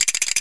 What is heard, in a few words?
Shaking; Bath